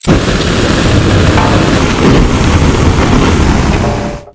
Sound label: tradeskill smelting